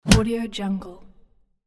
punch in face

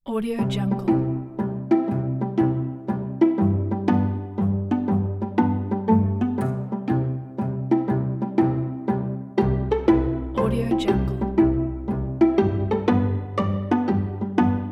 trendy strings corporate

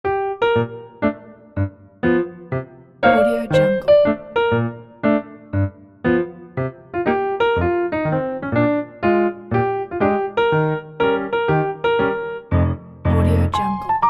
happy steps; acoustictracks

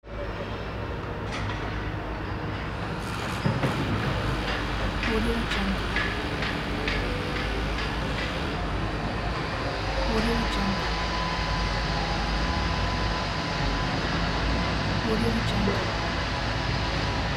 construction work; n-audio

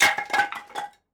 wood sticks fall on concrete; matter mayhem; soundmorph

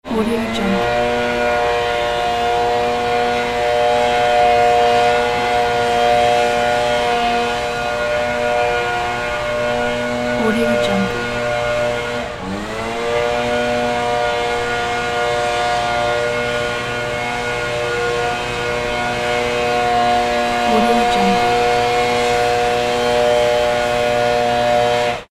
air blow; soundfxpro

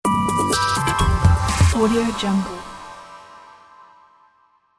sonic logo

